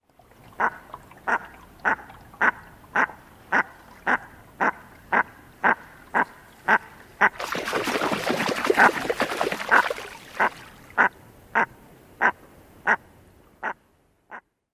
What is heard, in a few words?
female quacks